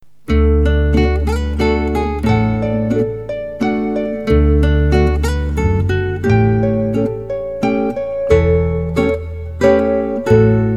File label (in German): Landler